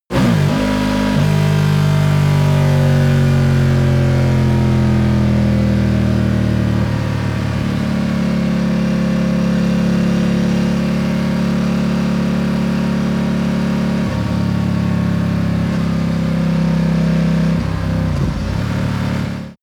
motorcycle ducati cruiser decelerate long

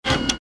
machine burst

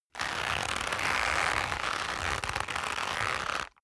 leather creak long medium

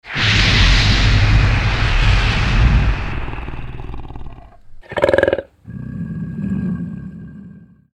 dragon fire snort

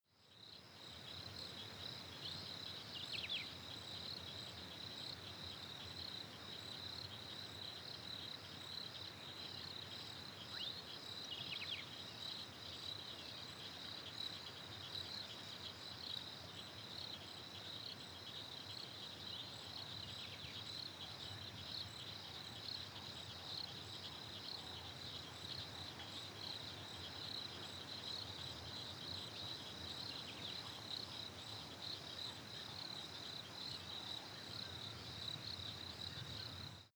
africa winter water frog

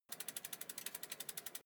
bicycle street freewheel slow

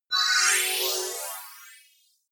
appear sparkle long

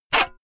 saw use short fast

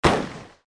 shot

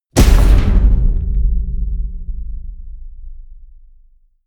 metal crash ring medium

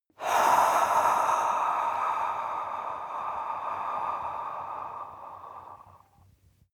breath female exhale heavy scratch

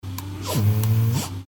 milker machine

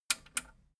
metal button radio click enable slow

